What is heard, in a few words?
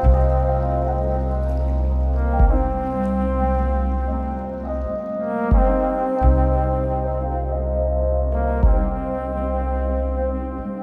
Waiting Room, Pads; BB 2HTC Samples Vol 4